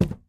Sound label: phys cube, physics